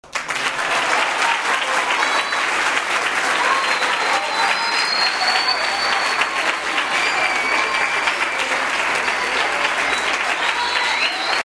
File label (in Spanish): aplausos stereo